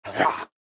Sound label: Monster vocalization